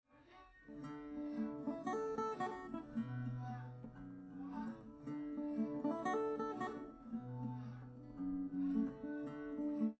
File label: no drums, live rec; SiSEC11; underdetermined